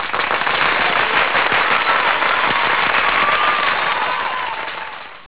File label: VIPER